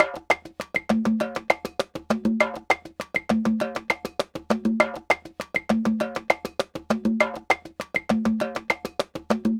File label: Ensemble, Latin Percussion